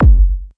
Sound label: distorted filter kick big; Kicks; single hits